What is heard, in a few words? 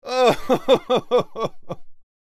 Joyful man laughing